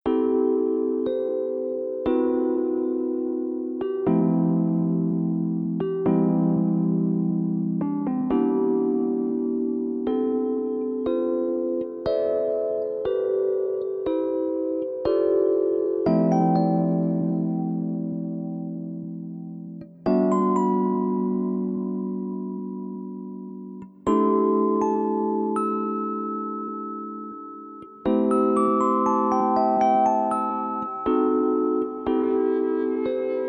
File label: gentle sound 432 hz; nice wake up; Miła pobudka